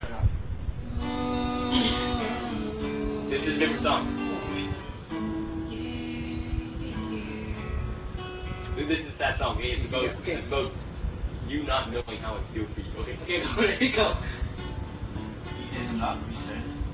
sad song

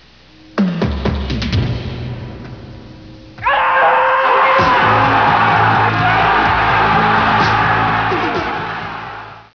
GOOD SCREAM

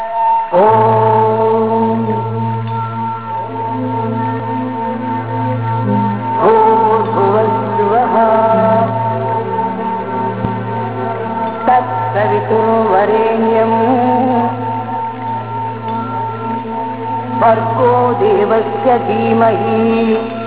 Gayatri

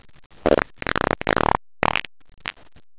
fart

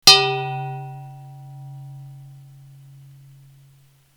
hoop

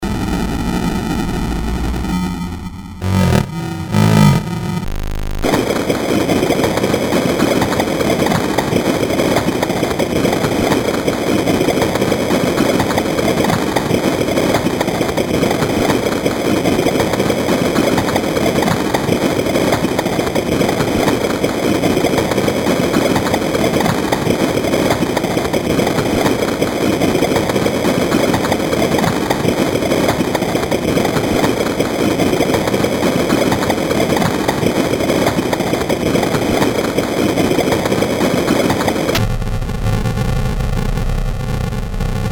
sound effect generator

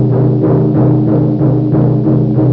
drum

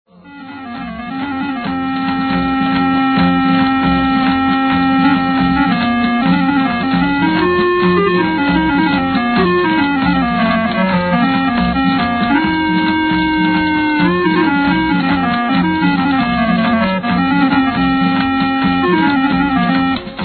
organ

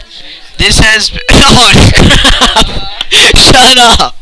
laugher

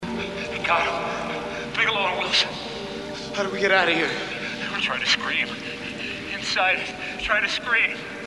try to scream

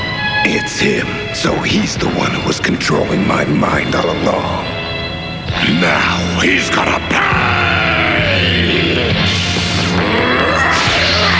Piccolo

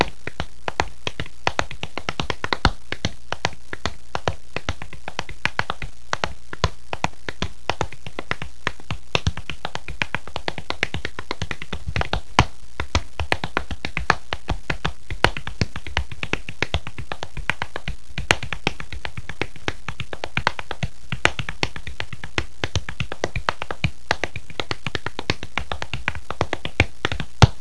tap long

